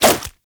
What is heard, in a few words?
nunchuck end whoosh; Warframe; Infested; weapons